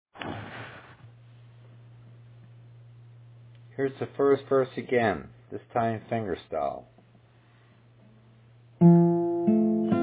First Verse Finger Style

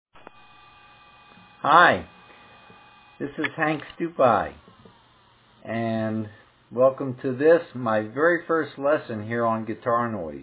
Smile melody

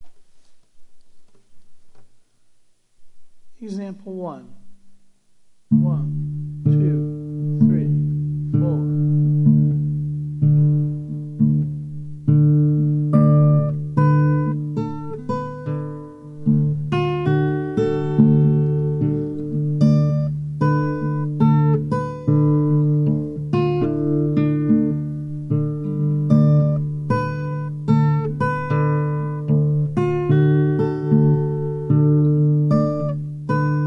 drop D

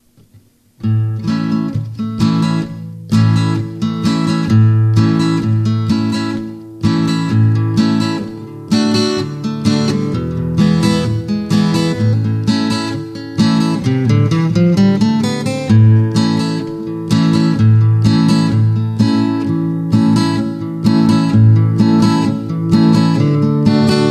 MODE, guitar

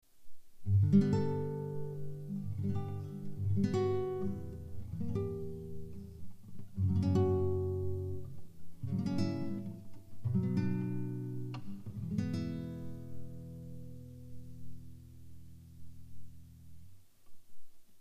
BELLS

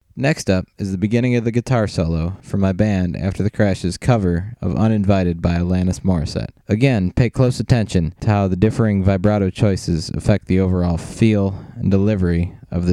Vibrato

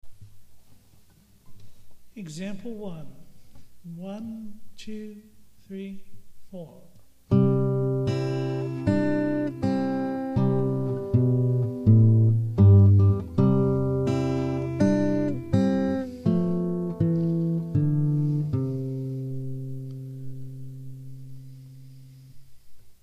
JINGLE

